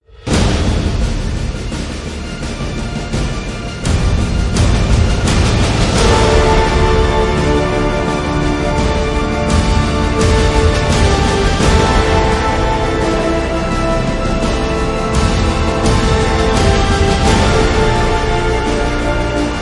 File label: No Choir